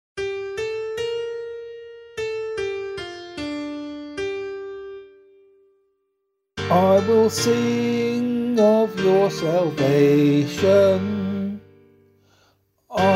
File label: Psalm C vocal